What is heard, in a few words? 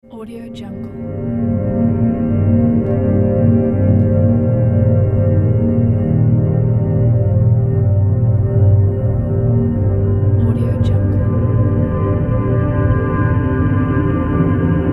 cinematic soundscape